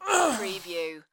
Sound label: Human Death, Male Dying Sigh, Grunt